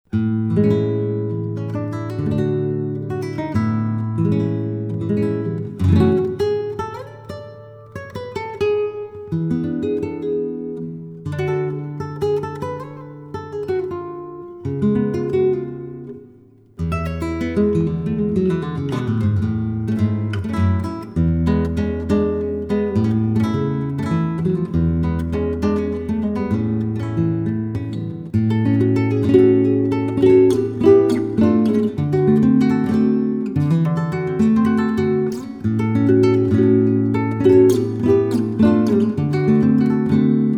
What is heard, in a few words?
Guajira, guitar